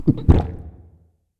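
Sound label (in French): Corps Gloups; Puddle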